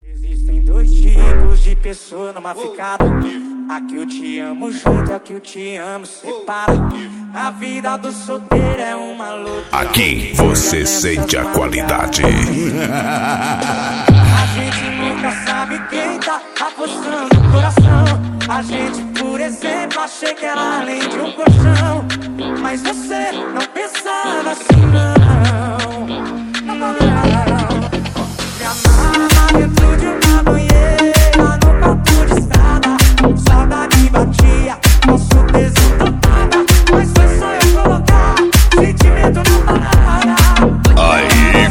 mega funk

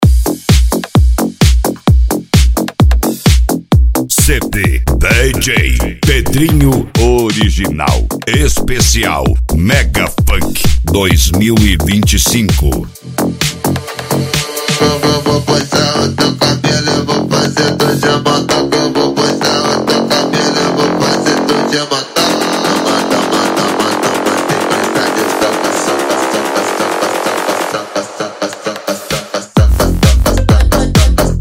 mega funk

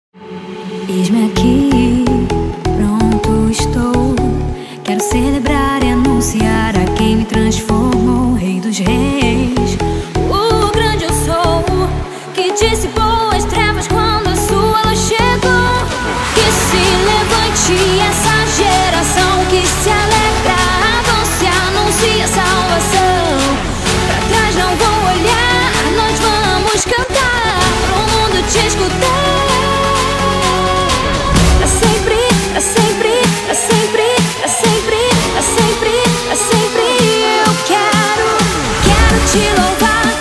remix gospel

